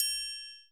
PERC27, PERCUSSION

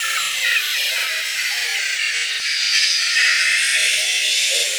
Airglow, Environments; Sonic Foundry (Sony Creative Software); Myths of Technology

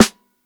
SNARE LOUD; ONE SHOTS; SNARES; breaks; Basement drums vol 2

